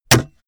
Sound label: sword hit wood